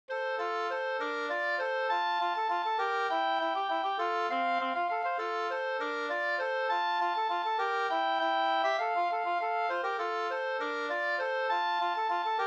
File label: polka